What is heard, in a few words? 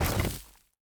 pick rock; Rust